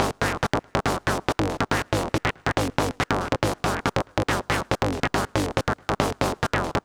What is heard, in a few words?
140 House Arp Cmin; Dance; DJ; Synth; Arps; Pro Tools Essential Loops; Digidesign